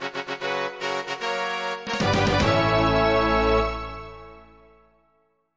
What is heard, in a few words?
Victory